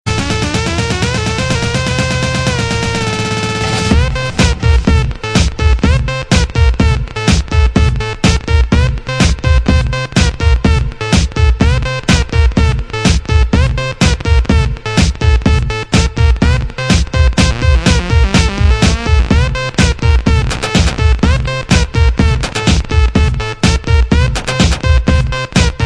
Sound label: techno beats